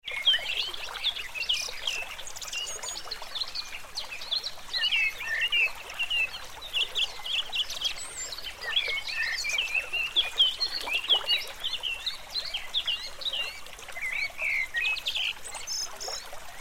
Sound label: River ambience light wind in the trees and birdsong